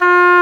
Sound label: Oboe D'amore; Roland L-CDX-03 Disk 1; Oboe 4-8